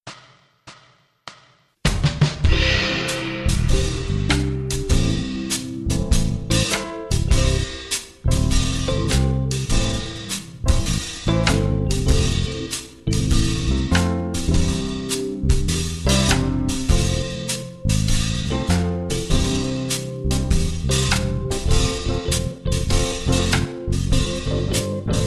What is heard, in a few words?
alto